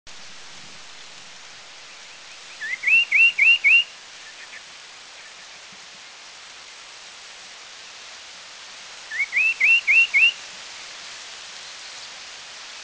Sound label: Black-breasted Parrotbill; India